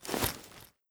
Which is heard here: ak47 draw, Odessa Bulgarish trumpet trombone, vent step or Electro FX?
ak47 draw